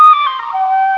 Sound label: flute